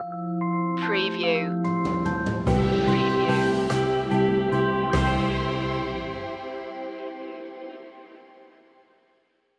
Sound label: stinger